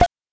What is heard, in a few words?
CLAVES; NES DPCM; MIDINES